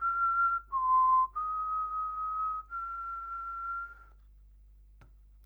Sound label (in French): harmonica sifflement 44100 Hz 2eme note; entre 0 et 0,6 s; chapitre 07 Emission propagation vitesse signal sonore